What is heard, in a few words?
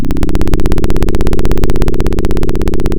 Sound of a plane taking off